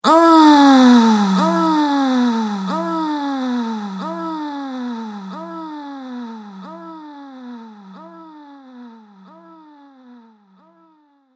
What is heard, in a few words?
Sex Groaning Woman With Delay